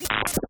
Sci misc Reload Alien Tech

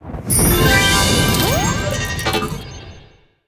Win Popup; Candy Crush Soda

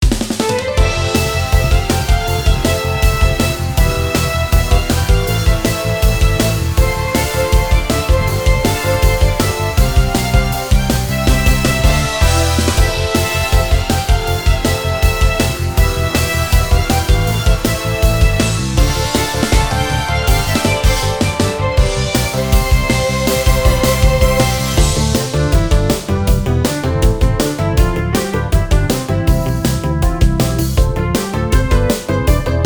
Victory!; Setsuei Tsuki to Mahou to Taiyou to soundtrack; Snow, Moon and Flower

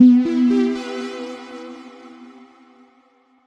Secret Reveal; smith and winston linux macos windows gamerip 2019

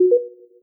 Present Stack

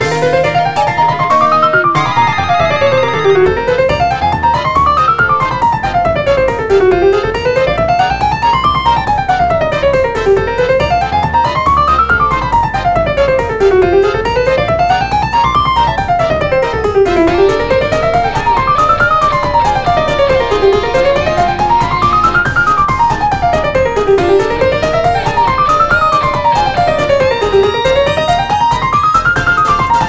Music Park (Piano); Mario Kart 8

